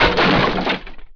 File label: fall; BESTSHOT; noisybit